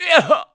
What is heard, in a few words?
Fishman Hit; Fishman hit with an arrow